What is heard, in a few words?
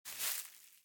thick grass